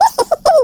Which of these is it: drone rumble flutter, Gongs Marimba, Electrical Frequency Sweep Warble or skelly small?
skelly small